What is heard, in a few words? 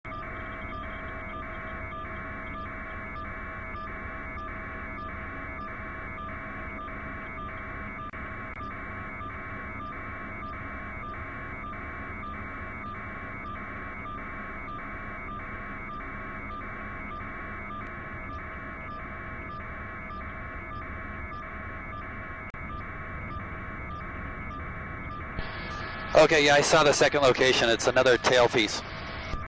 crash